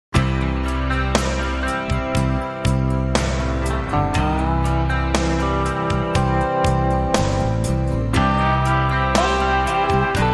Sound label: Contemporary Country Ballad